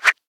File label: Here We Go Whoosh; SFXCore